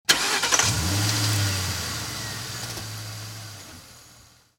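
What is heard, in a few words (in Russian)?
запуск двигателя автомобиля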